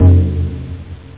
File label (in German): pauke; Aminet 21; GTI - Schatztruhe; Amiga 8-bit Sampled Voice; 8,363 sample rate